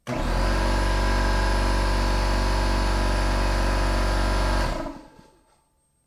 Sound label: Compressed compressor